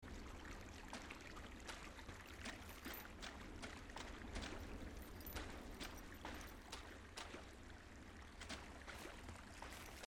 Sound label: player walking water; firewatch